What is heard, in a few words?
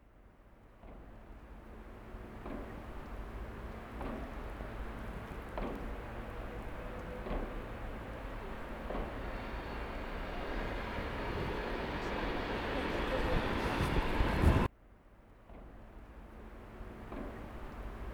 hammer and train